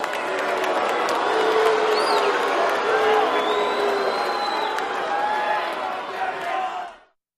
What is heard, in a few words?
Crowd Boos Short Burst